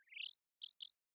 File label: sting bird chirp; Rust